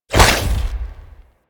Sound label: Shoulder Barrage Fire; Enemies; Infested; Warframe; Femur